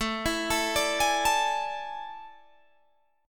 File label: A Major 7th Suspended 4th, Guitar, Open G